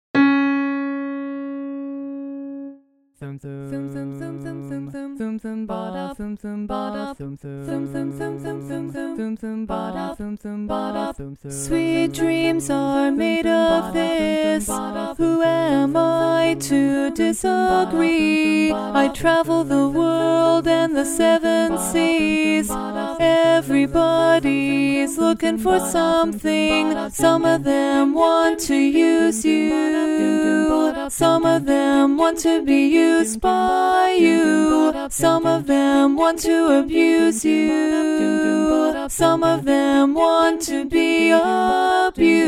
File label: lead dominant